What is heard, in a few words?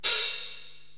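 Hi Hat Foot Open; Korg TR-Rack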